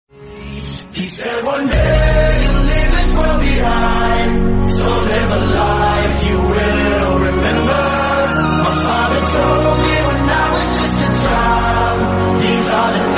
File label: soft english tone ringtone